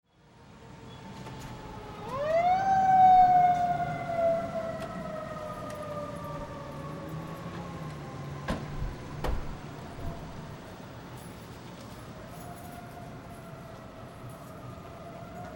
City Soundscape Siren, Emergency vehicle drives away, general city ambience; truck drives by; City Sound Effects; Lynne Music